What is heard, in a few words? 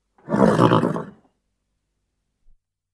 Whinny; افکت صوتی